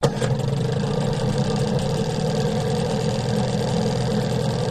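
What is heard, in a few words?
9.9 Evinrude Strt Loop, boat; 9.9 hp Evinrude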